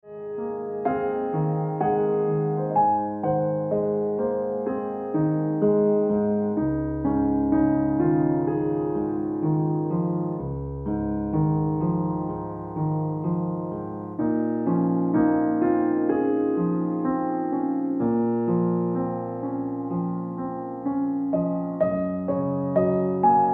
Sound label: Ringtone Piano Music